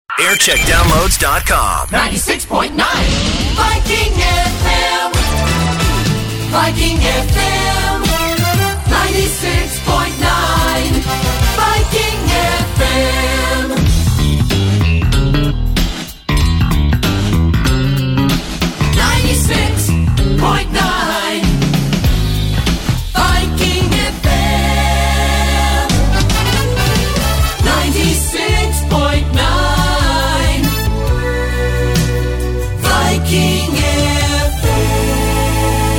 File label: Jingle Montage; Viking FM; JAM